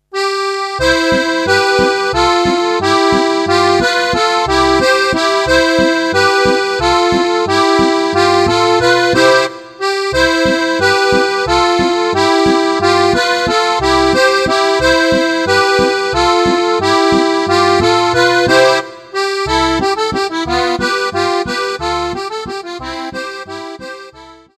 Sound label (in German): zwiefacher